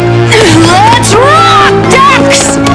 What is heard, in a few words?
rock